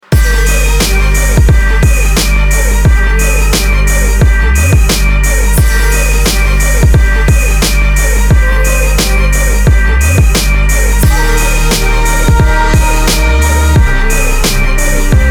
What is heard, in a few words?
instrumental